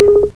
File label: Low Tone; Mac Expert - Winter 95; WatchWork 1.0